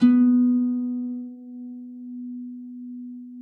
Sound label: A#3, HARP; Xsample VOL-8; HARP P+MF